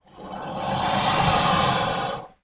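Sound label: Alligator Sigh A Heavy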